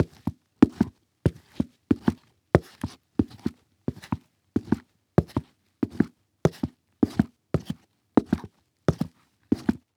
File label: Foley Footstep Soft Sole Work Boots Walking On Cement Long